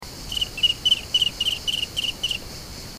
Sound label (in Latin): Nemobius sylvestris; BIOAC ORTH